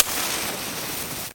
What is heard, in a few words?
ignite; ZBomb